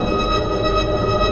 Sci-fi UFO Loop; SFX Kit; Sci-Fi Loops

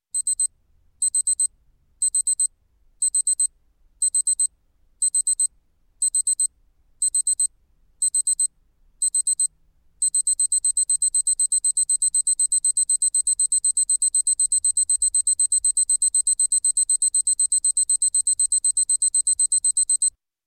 Clock, Desk Electronic Alarm Beeping; Sound Ideas 2000 Series

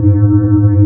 Shield Fast; Apotheorasis

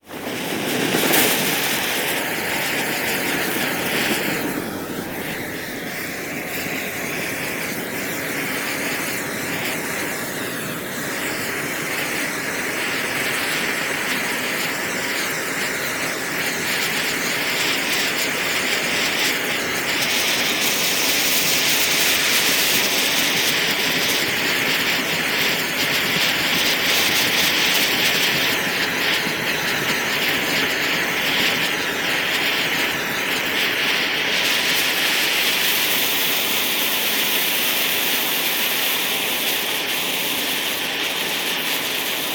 Fuse Flare; Sound Ideas; Guns

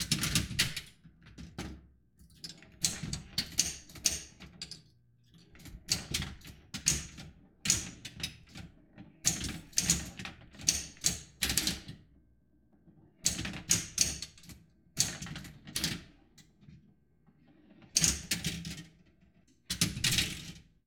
Pinball Play

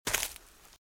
Footsteps - Leaves - Walk - Right; RadwinIsland

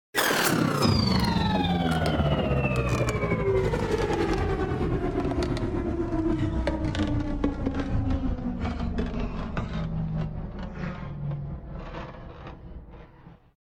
Space Ship By - Turn Of The Engine; Hollywood Edge; sound designer's toolkit v2

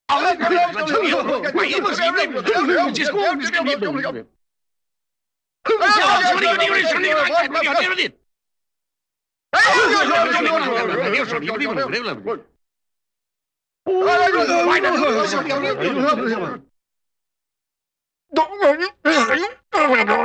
Small Group w-excited Reactionary Mumbles; Cartoon; Hollywood Edge; Cartoon Trax

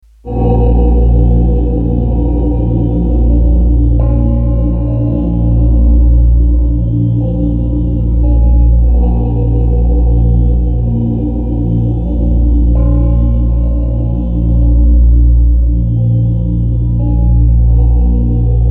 Low And Eary; RoosterFX; Drones

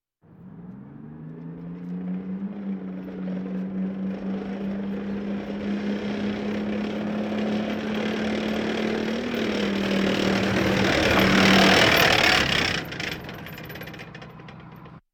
Military vehicles

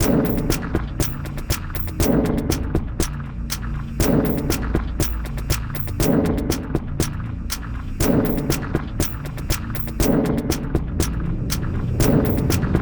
battle Theme

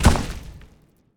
Earth Tank Footstep; AudioWizards

